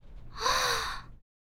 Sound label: Girl Sigh Of Surprise